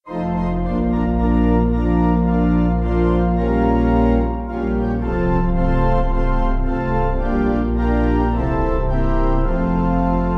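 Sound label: pipe organ, Free Music Backing Files for Churches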